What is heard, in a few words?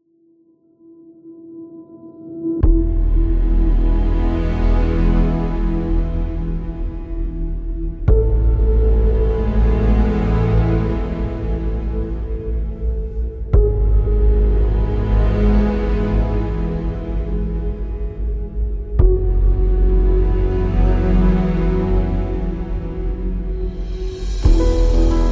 Documentary Ambient; epic music vol 2